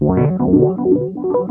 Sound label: ORG ROLL, Synth FX Misc